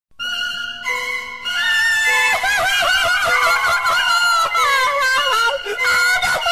cry with music; flightreacts; spongebob

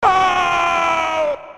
bloodcurdling scream

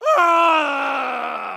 impaled scream; mk9; kenshi

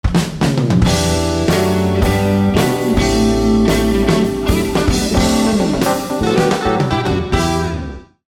jingles

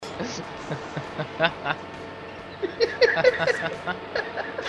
laughing

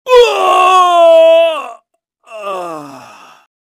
scream; liu kang; mk9

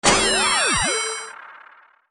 retro game enemy laser cannon

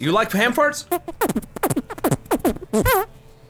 hand farts; iamsp00n

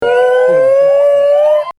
puuuuh

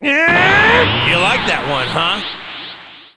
yeeeaah

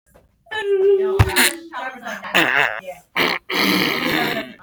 stinky farty boy

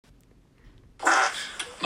fart

